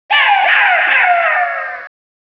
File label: ambi, jungle; gearbox